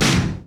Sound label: SNR, DRUM ZONE; Masterbits - Total Zone